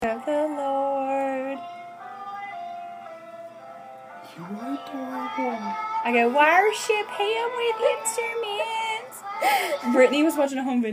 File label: Worship